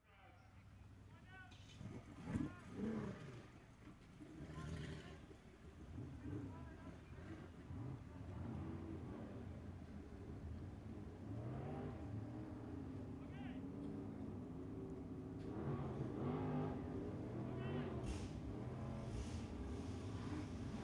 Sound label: Drag Boat Racing Amb